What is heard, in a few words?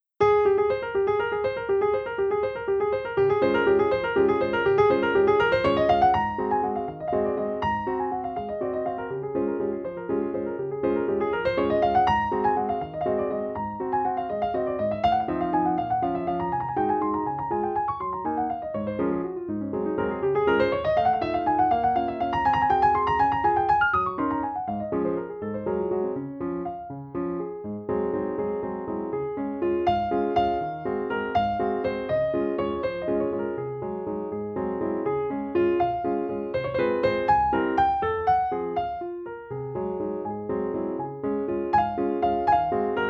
Minute Waltz 80BPM; Chopin